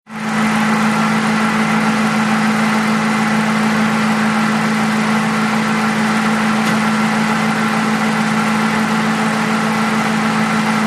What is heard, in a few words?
Air Comp Motor Run, air compressors